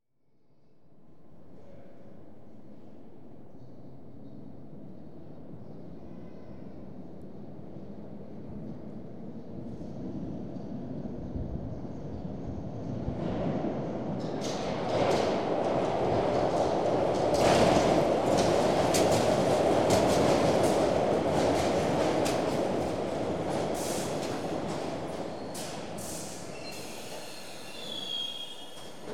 metro pribitie i otbitie poezda

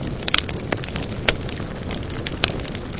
pozhar hrustyashii blizkii chetkii

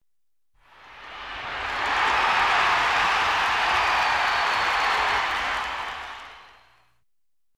zvuk zritelej na stadione korotkij krik variant

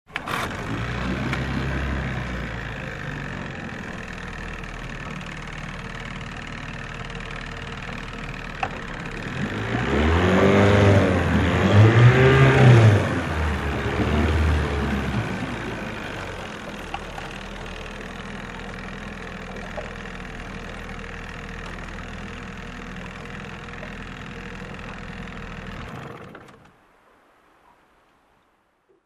motornaya lodka zavoditsya zadnij hod glushitsya

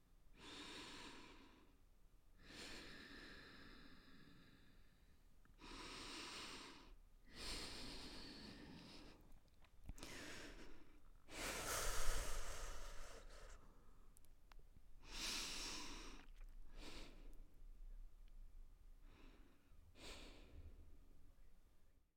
dlitelnoe dihanie zhenshini

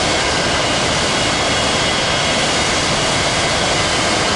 zvuk motora samoleta posle prizemleniya

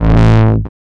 ejsid haus odinochnij shumnij dolgij

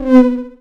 knopka cifrovoi blizkii zvonkii korotkii

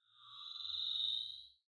avtomobil budushego visokij priglushennij

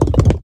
isterichnij nervnij stuk palcami po stolu

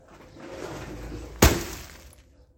meshok s oblomkami brosayut

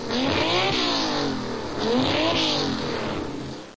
zvuk gazuyushego dvigatelya

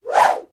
svistyashij vzmah dlinnim mechom

